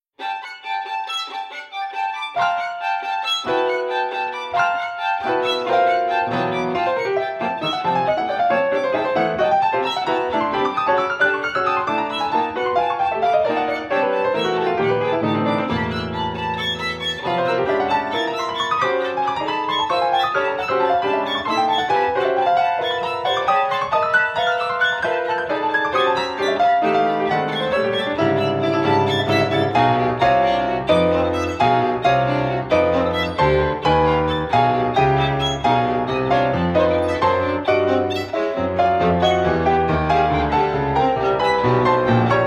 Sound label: Sonata for Violin and Piano